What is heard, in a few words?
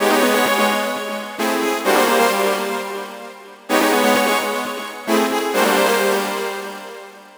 NRG Pad